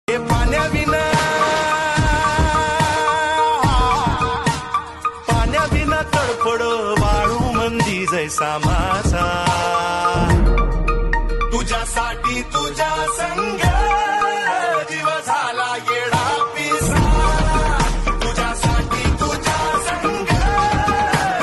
marathi ringtone popular ringtone bgm ringtone mobile ringtone